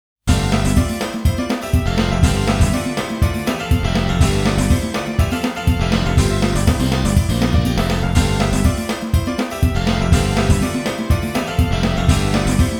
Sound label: Goal Replay BGM